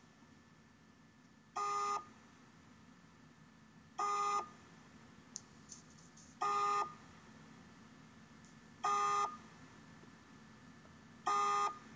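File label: error beep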